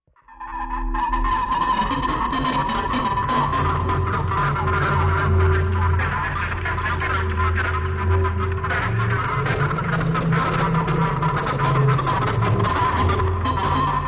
Message Mixer Machine; communications

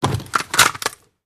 Gun Fast Grab, handgun

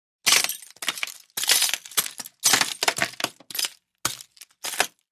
Footsteps Broken Gla

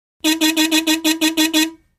1940 Car Horn Small

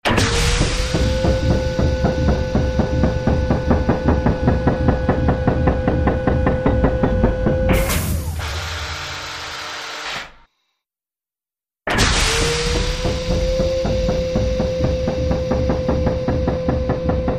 Vault Mechanism, safes

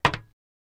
FS Loafer On Metal, singles metal

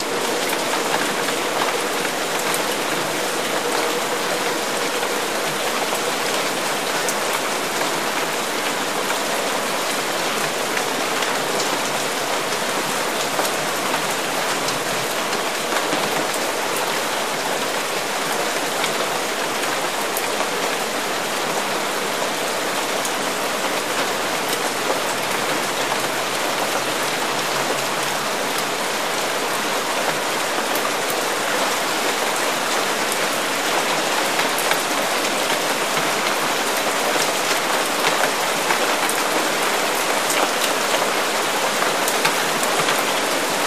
Rain Heavy On Grass

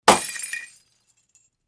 Light Bulb Smash

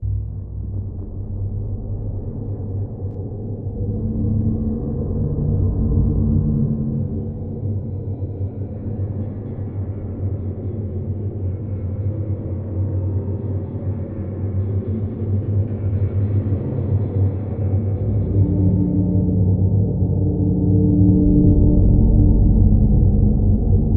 Monster Sleeping Deep, deep

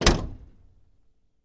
Camaro Door Close Hea, chevy camaro

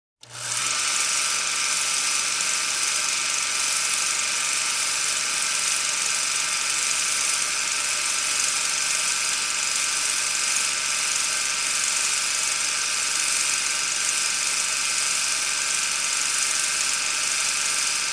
16mm Projector Start; movie & projectors

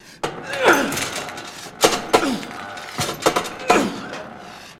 Gym Machines and Weight Ambience, Grunts

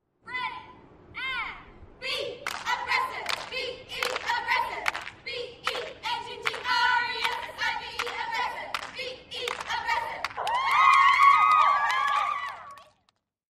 Cheerleaders Cheer

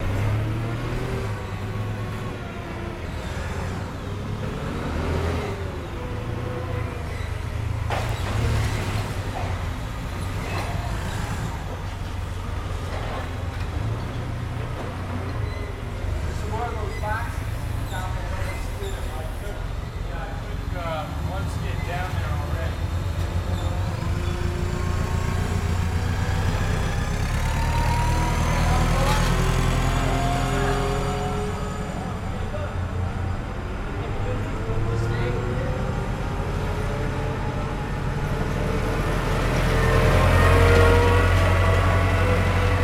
Forklift Running In Warehouse, Some Talking

